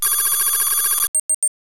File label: Device In; CSI